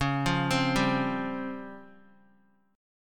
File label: C sharp Major 7th Flat 5th C sharp, Guitar; arpeggiated; chord; x,4,5,5,7,x